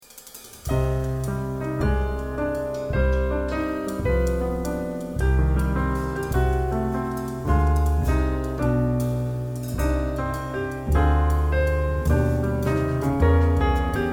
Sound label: melodies